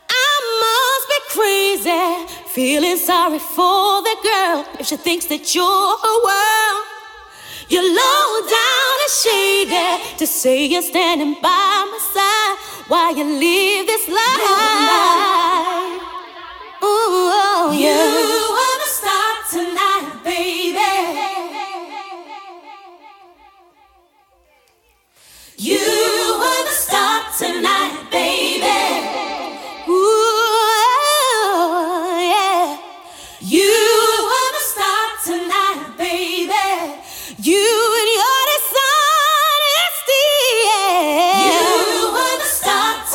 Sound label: acappella